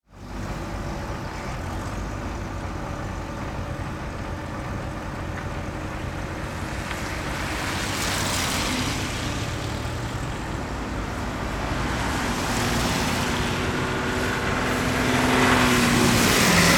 Traffic

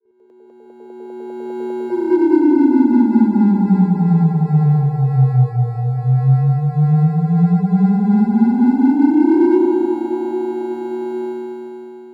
Nuclear Reactor

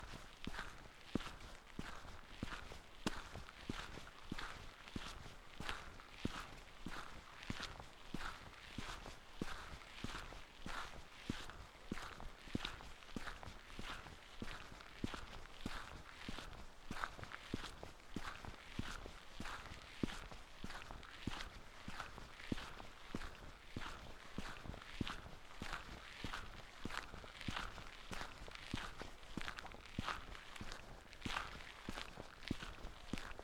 Footsteps On Tarmac

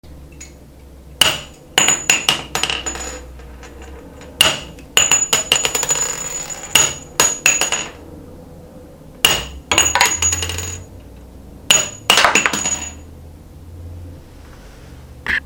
Handgun Shells Drop

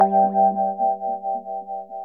Nords Vibes

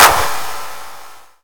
Clap

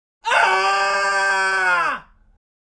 Human Male Scream Scared Pain

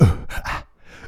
Boom Uh Ah

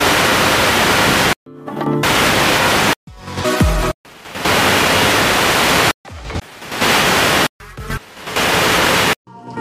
Radio Stations Switching

